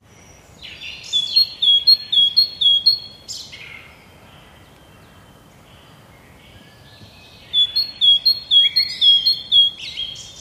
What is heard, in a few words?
birds